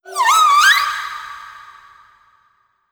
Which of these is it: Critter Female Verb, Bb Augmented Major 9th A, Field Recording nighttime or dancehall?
Critter Female Verb